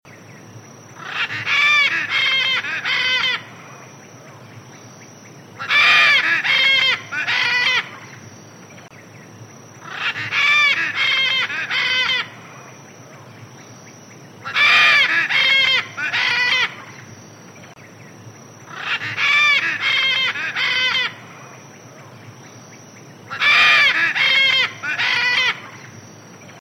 Blue Throated Macaw; A Bennett Hennessey

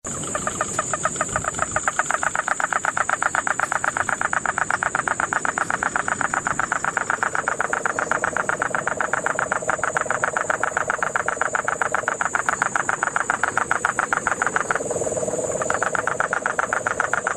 Puerto Rican Crested Toad; Wilfredo Falcon; UPR-Humacao

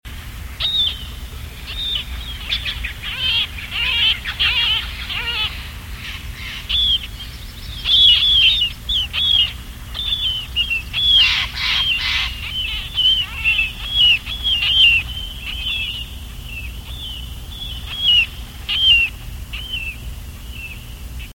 Marbled Murrelet

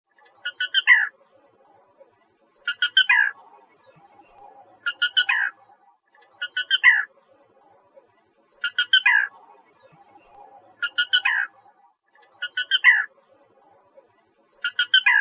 California Black Rail; Naturesongs

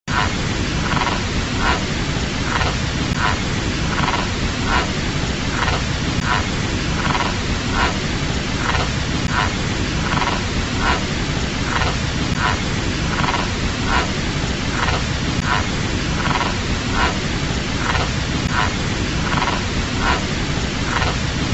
Mtn Yellow legged Frog; Davidson; Ziesmer

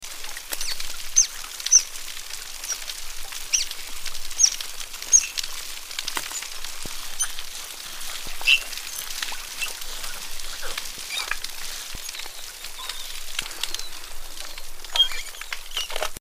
Dugong; Amanda Hodgson

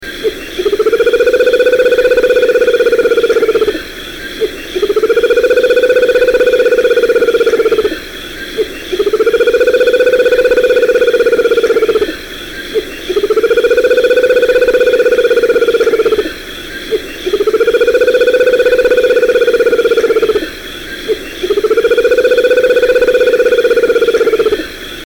Puerto Rican Screech Owl; Mark Oberle

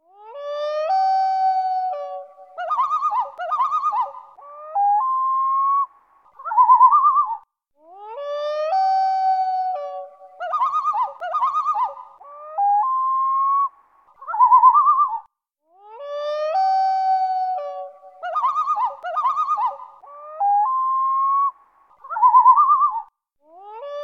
Common Loon

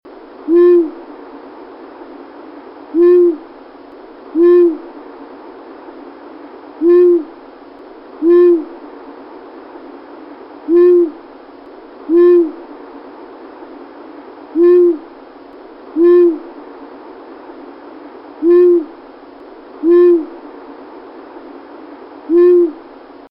Long Eared Owl, Gloucestershire

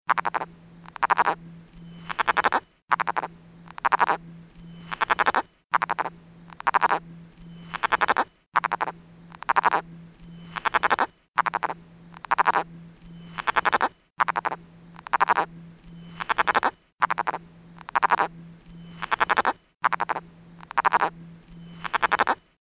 Cascades Frog; Carlos Davidson

